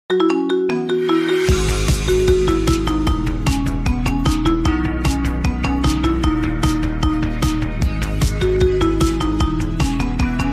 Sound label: marimba